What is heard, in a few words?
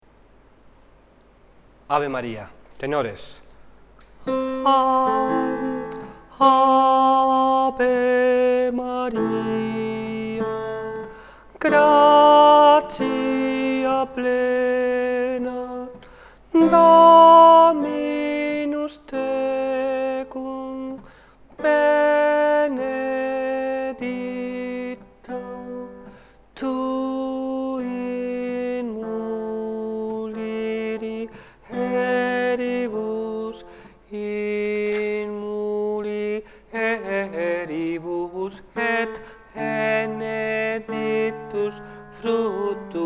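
tenor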